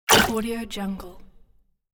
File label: laser gun